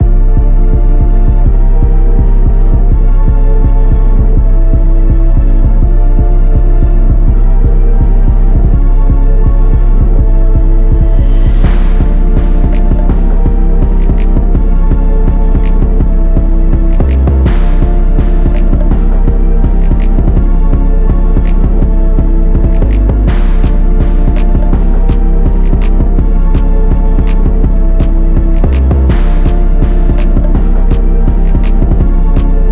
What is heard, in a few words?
Dm BPM165